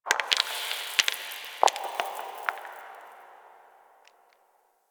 Moon Dust Fall Small, Ambience; Warframe; Orokin

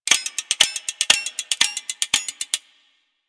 Grenade Warning; Warframe; Grineer Ghouls; Ghoul Expired; Attacks

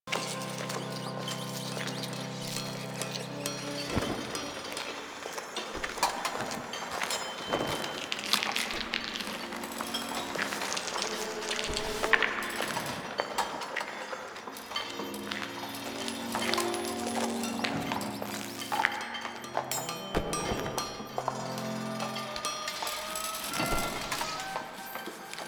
Town Foreground Amb Loop; Exterior; Ambience; Duviri; Warframe

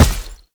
Heavy Step Default, Footsteps; Enemies; Foley; Warframe; Tau Dax

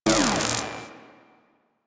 Sign Stop; Ambience; Props; Warframe; The New War; Zariman; Quests

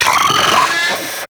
Arachnoid Vocal Leap; Enemies; Vocals; Warframe; Corpus; Arachnoid Hunger

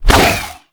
Star Whoosh, Infested Throwing Stars; Warframe; Weapons; Coda Pox

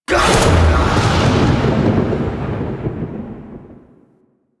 Rising Blades Impact; Warframe; Warframes; Pax Duviricus

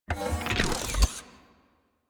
Turret Harness Pilot Unequip; Warframe; DE; Vehicles; RailJack; RailJackTurretHarness

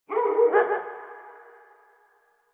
Distant Ambient Dog Bark

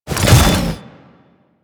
Flak Cannon Empty Clip; Warframe; UT Flak Cannon

